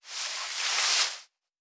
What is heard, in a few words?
Foliage Foley